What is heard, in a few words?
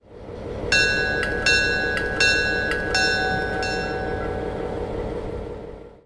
Bell Howard; RailPro